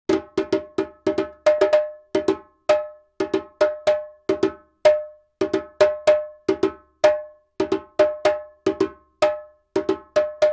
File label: djole djembe; rhythms